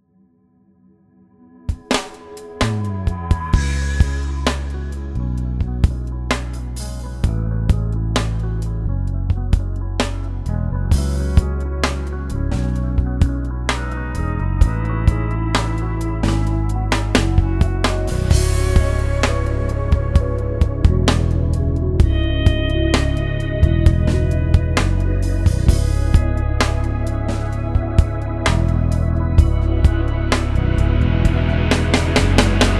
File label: Backbeat 64 Bubble